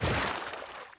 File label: wet step